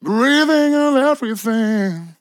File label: MAN LYRICS Fills 120bpm Am